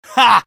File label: laugh short; scout; source engine; tf